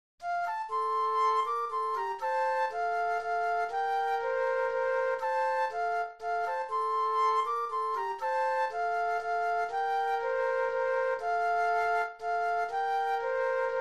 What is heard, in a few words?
Flute Duet